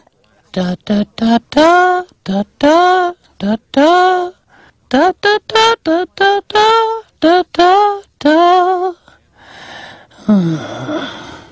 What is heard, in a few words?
ice cream truck